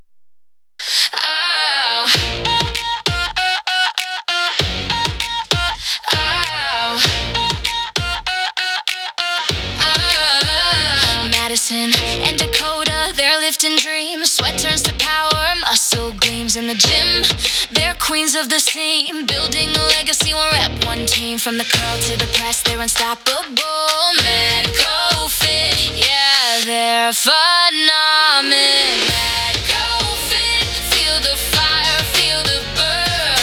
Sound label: synth female voice; madco fit